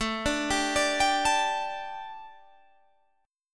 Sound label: A 7th Suspended 4th, A, down, Guitar, Half Step Down